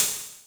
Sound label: CYMBAL, Yamaha RM 50; Drum Samples; Cymbals